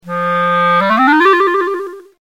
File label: clarinet